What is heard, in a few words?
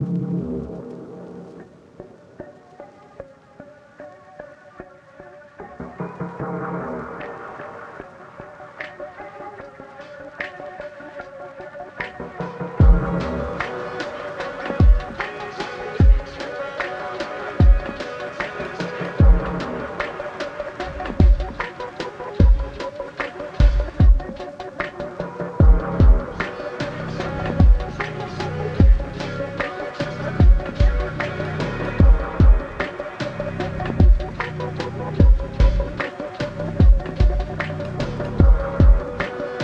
Gaming Soundscape